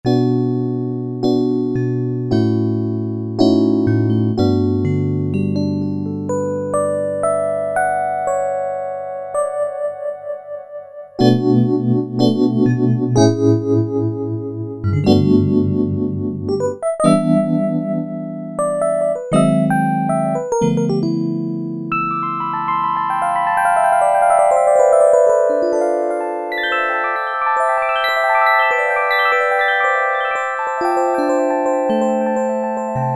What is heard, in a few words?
El. Piano, E-mu Proteus-1; Presets